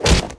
Attack Fist

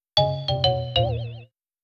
Jingle Very Bad Response

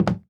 Footsteps Sneaker Wood Walk; Infernal Radiation

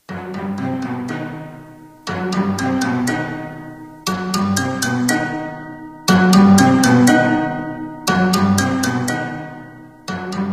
The Plane Hatch

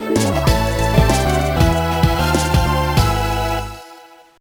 Goal Complete; the urbz sims in the city; gba

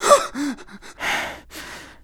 Voices Male panic

